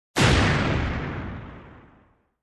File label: Explosion up-close